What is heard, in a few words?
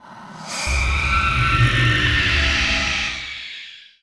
roar, seamonster; Giants